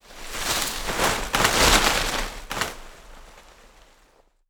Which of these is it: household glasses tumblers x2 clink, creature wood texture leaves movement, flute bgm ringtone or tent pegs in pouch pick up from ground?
creature wood texture leaves movement